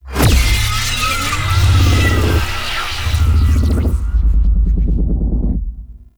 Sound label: Rain Of Arrows Target; Warframe; Duviri; Dax Archer; Abilities